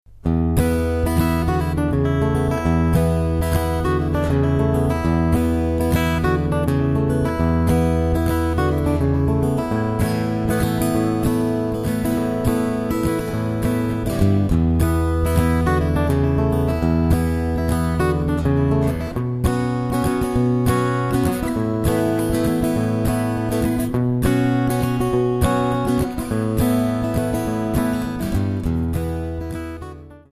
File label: blues; convention